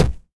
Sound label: Footstep; Spine Examples